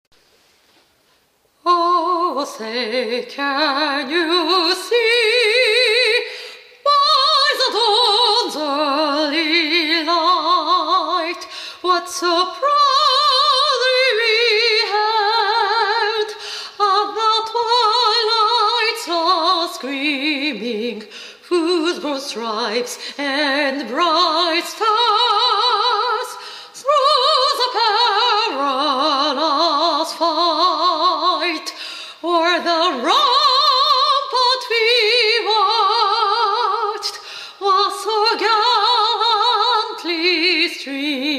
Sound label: Star Spangled Banner solo